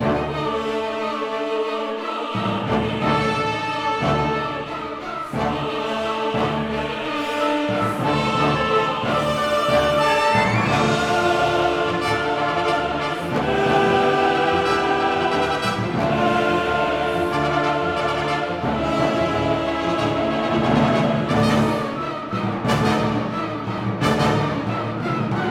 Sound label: Music Combat Boss Agile Phase